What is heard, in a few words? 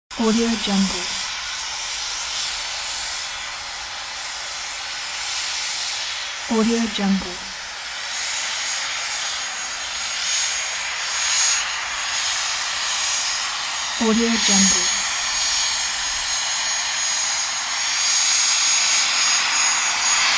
air whistling